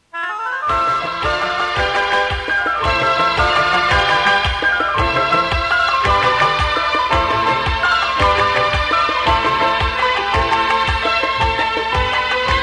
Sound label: Choir Orchestral; Anar Choir